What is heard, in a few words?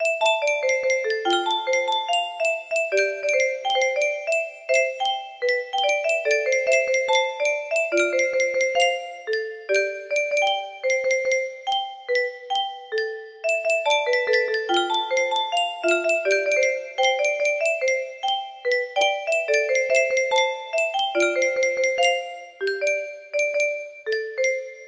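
music box melody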